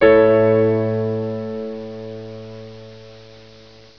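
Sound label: PIANO CHORD A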